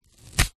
household band aid plaster strip tear snap off roll